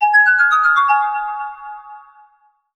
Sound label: Reminder, phone; Sony Ericsson; Venus (Xperia X1); X1 Family 2